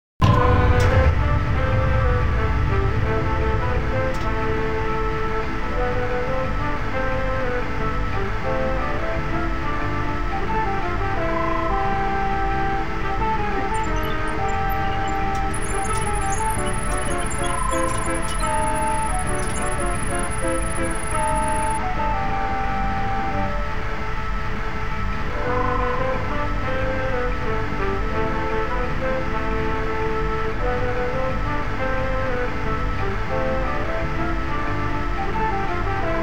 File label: Elevator Tune; The Conduit; Wii